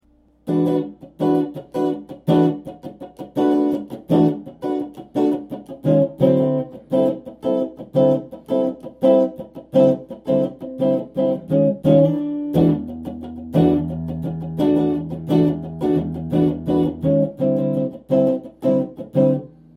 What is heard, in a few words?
funk